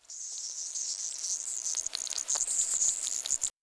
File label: crab effect